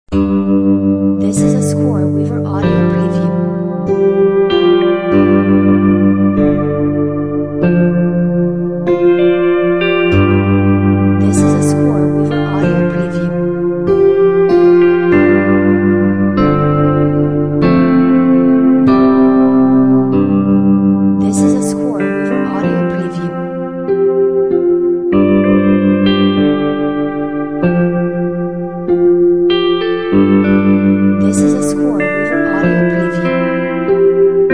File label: lonely guitars; scoreweaver